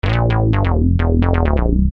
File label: Syn-Bass m C, Techno Synth Loops, 130bpm; Sonic Foundry (Sony Creative Software); Signals I